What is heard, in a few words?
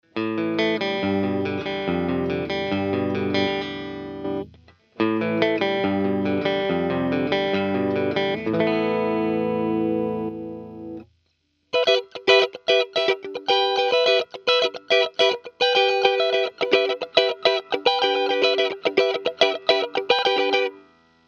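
Red comp, Line 6 M13 guitar processor; MXR Dyna Comp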